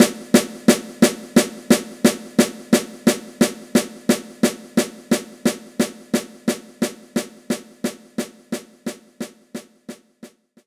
Jazz Snare Hard; knights try

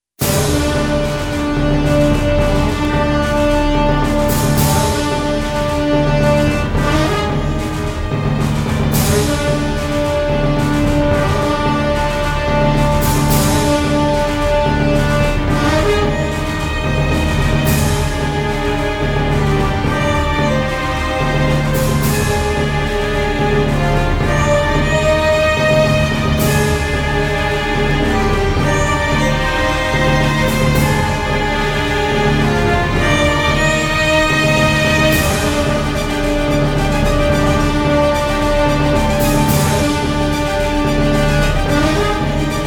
combat volcano; planetside

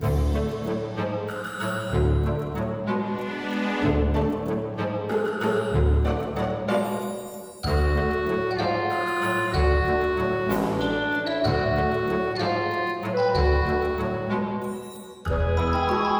Game Music; Cut the Rope Arcade

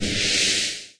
Breath bullet

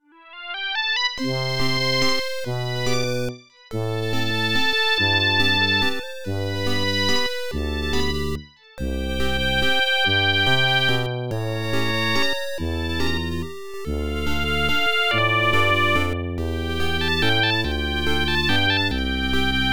Waltz; Burning Point; PC-88

